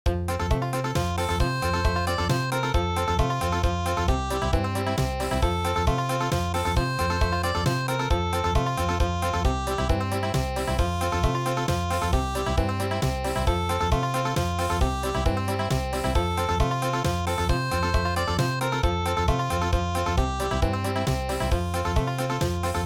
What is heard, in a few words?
Country